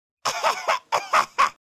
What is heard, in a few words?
Poison Cough; hedgewars; Default es